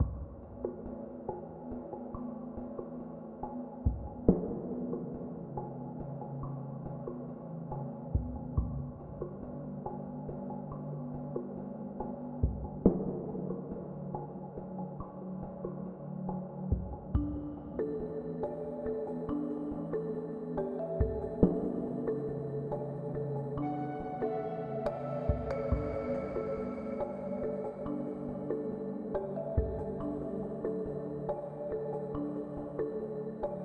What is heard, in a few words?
Desert Music Section A2 70bpm